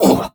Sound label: blademaster hit; Crypt of the NecroDancer